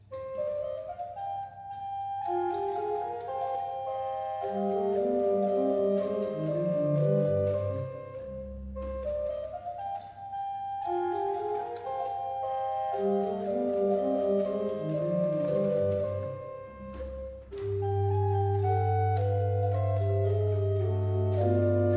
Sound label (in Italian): Variazioni, organi; APPENINO MODENESE; Mozart; Ah, vous dirai-je Maman KV 265